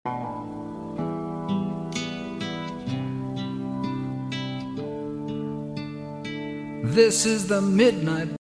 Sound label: midnight blues apple ringtone